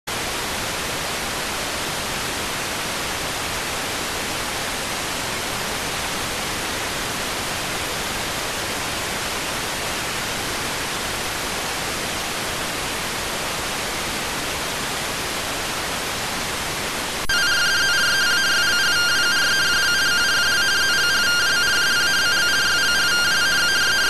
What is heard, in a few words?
rtty rx